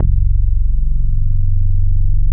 SH 101 BASS; BASS SOUNDS

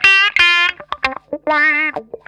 SHRILL WAH, Funk Guitar; Zero G - Funk Guitar

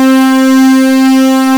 WAVE PWM

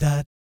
DAT D3, Vocal Planet Jazz & FX; ILIO; JAZZCOMBO

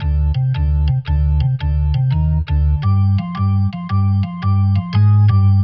Jazz Keys Organ 85 bpm Riff DG

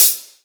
HH CL AMB, AMBIENCE HI HAT

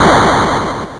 Atari Noise Long, Atari 2600

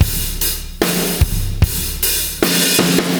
Beat Loops; Zero-G; Monster Beats

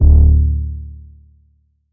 KEYS 808

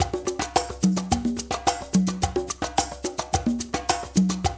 LOOP L, Percussion; InVision Interactive; Percussion Slam; PROGFROG

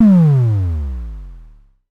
DSM2 Sub; MPC DSM-2

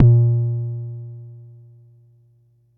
Bassdrum; Jomox Xbase09

